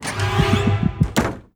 sfx crazed; Animation Throwdown

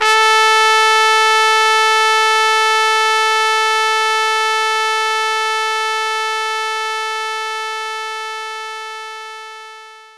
Trumpet A4

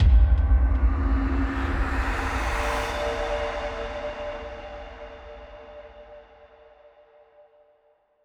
Stingers sting misery

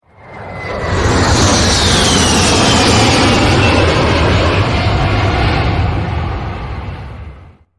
A10 Flyby; Wave; Criminality

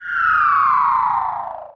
Sparkle Fall; knights try

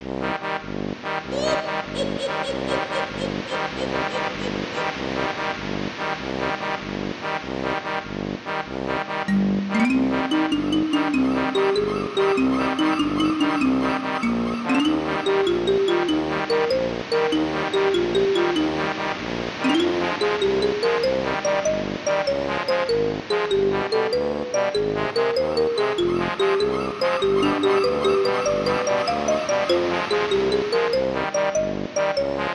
(with Ambience); Banjo-Kazooie; Gruntilda's Lair - Rusty Bucket Bay

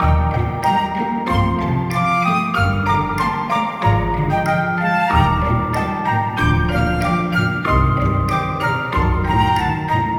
SHOP LOOP

